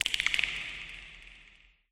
amb creature antlion click; Half Life Alyx

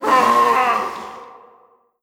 scream, zombie; creatures; trainyard

